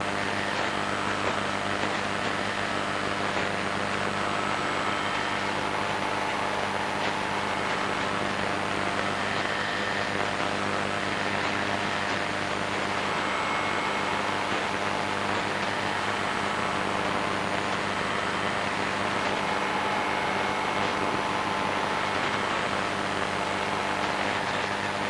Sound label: Radio AM